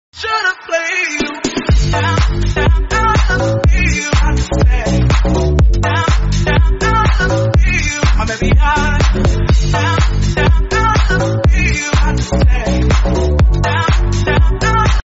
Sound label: beat drop